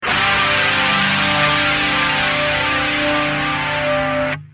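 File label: guitar Dimension C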